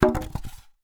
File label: Polystyrene Rattle